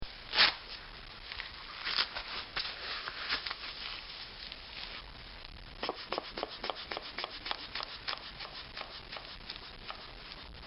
Taking Blood Pressure; Bobby Cole

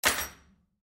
Dishwasher Knife, Dishwasher Place Knife In Rack; Whirlpool Appliance; Household; Appliances; Blastwave

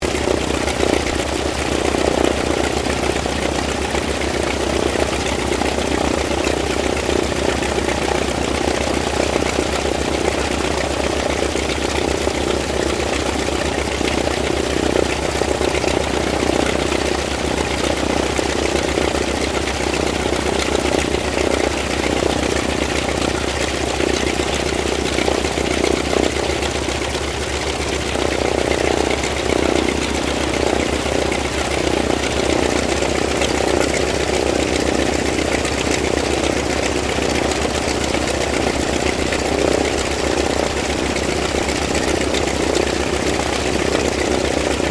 AIRCRAFT CA-28 CERES IDLE; Airplane; Airplanes; Vehicles

